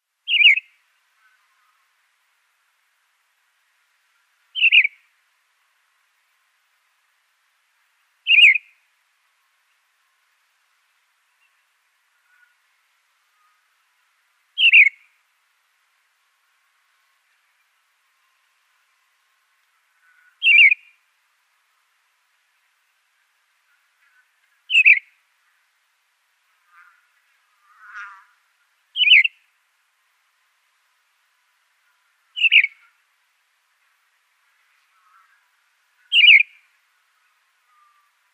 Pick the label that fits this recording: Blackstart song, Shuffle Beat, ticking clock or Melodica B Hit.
Blackstart song